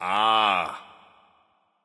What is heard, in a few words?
grunt understand ah; bacchus